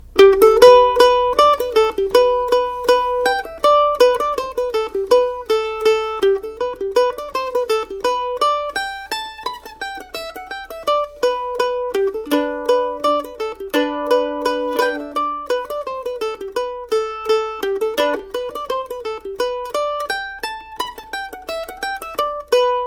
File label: white cockade mandolin; tune tutor